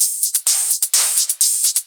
Electro Loop 128BPM; Vengeance Samples

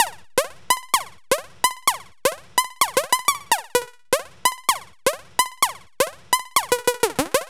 Melo 128BPM; Mini Kits

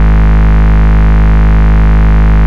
Bass, Root G#; Vengeance Samples

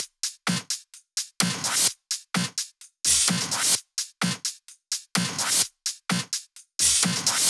128BPM Machine Kit

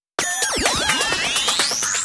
Fill 128 BPM; Vengeance Samples; VEH1 Loops

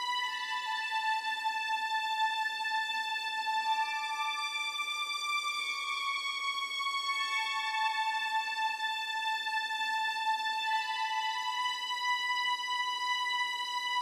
137BPM Strings, A major

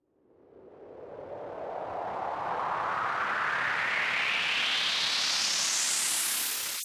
Sweep Up FX 140BPM; G minor; Sound FX; Vengeance Samples

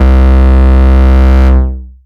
Ultimate Bass Root C; Vengeance Samples

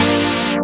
Synths G; Vengeance Samples

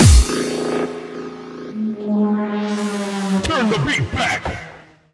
Special Sounds Root G# 140 BPM; vengeance essential club sounds-3

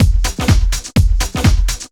drm 125, drumloops percpattern; PS-28 Vocal House Samples

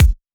Soft Kicks; Vengeance Samples

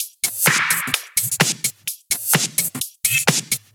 House loops 128BPM; Vengeance Samples

